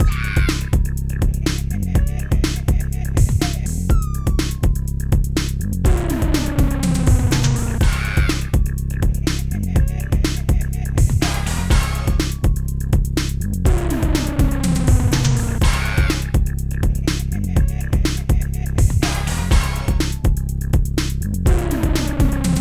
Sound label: Upbeat